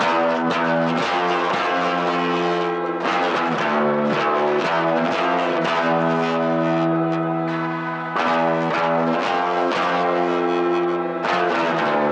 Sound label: Git Strings push